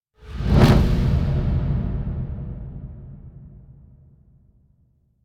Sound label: Whoosh Heavy Abstract; Hollywood Edge; sound designer's toolkit v2